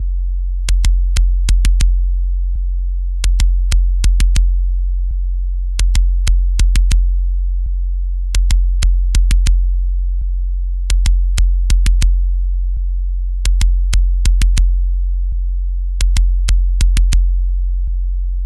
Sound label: techno